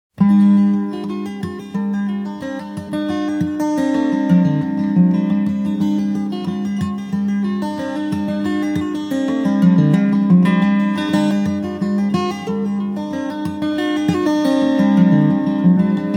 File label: Jig